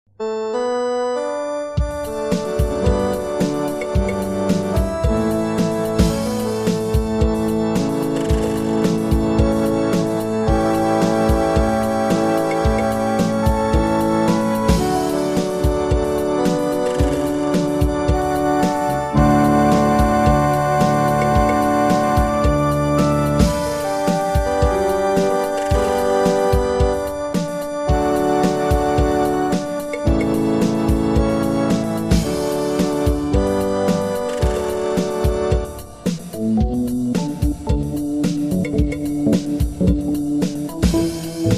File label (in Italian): solo musica